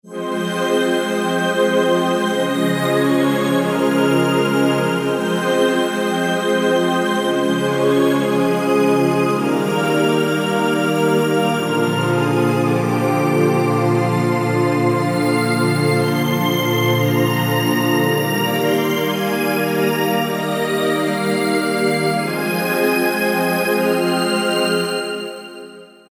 Pad Hollow Pad

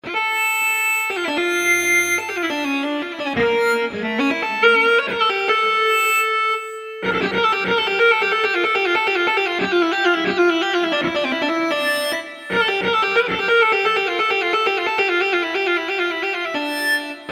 Rock Axe mono

